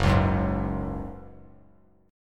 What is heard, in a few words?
Gb Suspended 2nd, chord; Gbsus2 strummed; 2,4,4,1